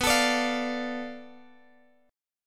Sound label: B-Minor 7th Flat 5th B down Guitar Open G